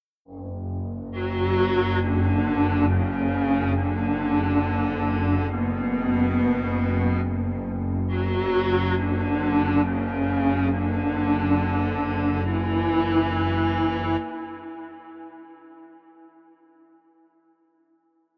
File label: Crimson Strings